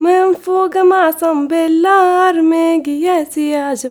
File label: GIRL, GIRLVOICE, Arabian Traditions; USB Soundscan vol.43; AKAI